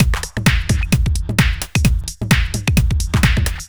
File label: 130 Driller Killer Half, Drum Loops 130bpm; Zero-G; Soundclash Nu Dance Electro; Acidized Wavs